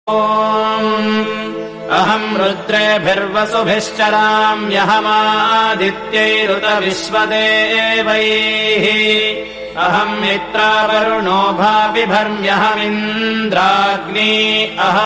mantra, sanskrit

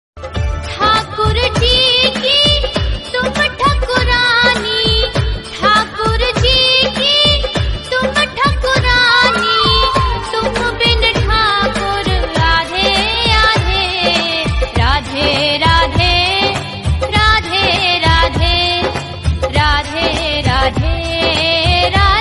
devotional song

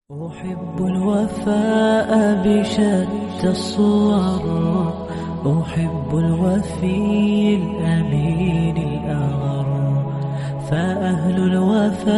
loyalty nasheed islamic

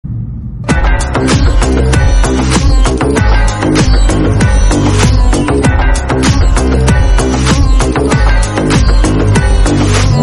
instrumental, climax, bgm; vikram; dilli; rolex

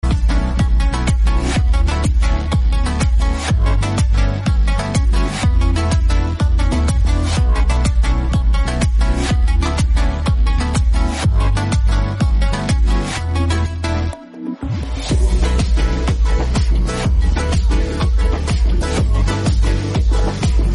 romantic instrumental

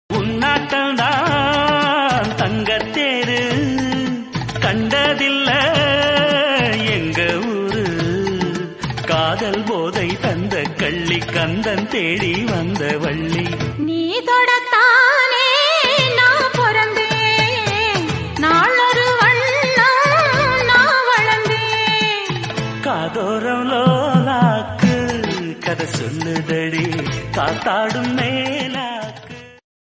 Tamil song

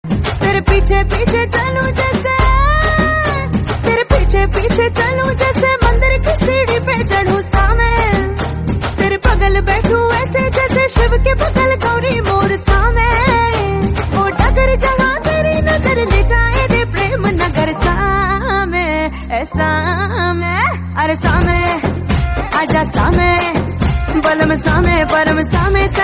lofi hindi song; pushpa

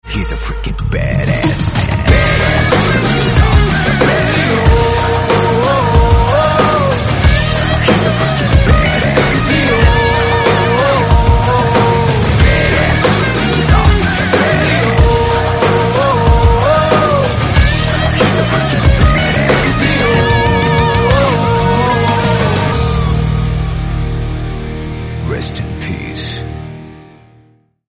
movie bgm badass

